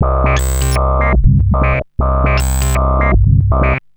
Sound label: Analoop, Industrial Analog